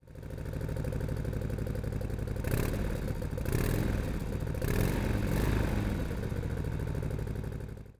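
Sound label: Dyna Closed, exhaust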